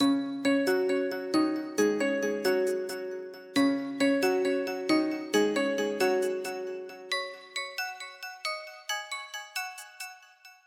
Bells, ringtones; MobiWire HomePhone 4G

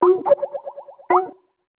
file transfer complete; Netgear SPH101 Skype WiFi Phone; ringtone

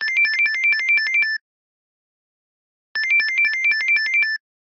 Alert; Gigaset; GS290

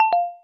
cam stop, camera; LG P940 Prada 3.0

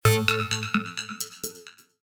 Alert Effect; Samsung SGH-T839 Sidekick 4G; notifications

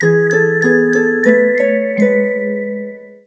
Alert; Samsung; SGH-i300

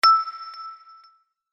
Ding

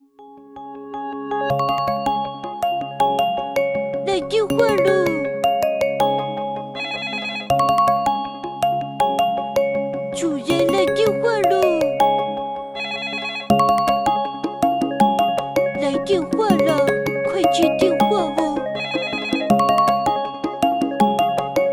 Charming Melody; Honor 600 Pro China; ringtones

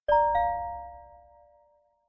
Tinkling, notifications; Infinix XOS 16

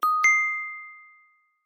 Tinkling, notifications; Itel Power 80

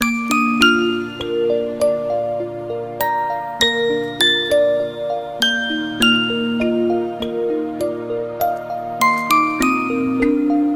Crisp Bell, alarms; Itel A04

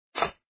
Crack Platform; INOI 109; Extreme Run

